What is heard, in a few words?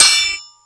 SW HIT, MORESWORD; Total Control - Action Cutz